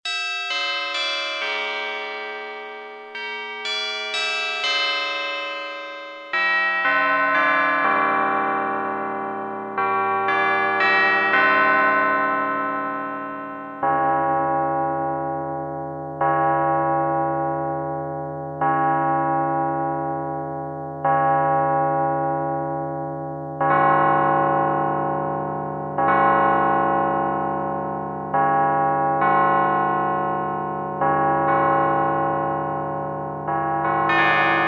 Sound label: Church Bells; Kurzweil K2000 Orchestral ROM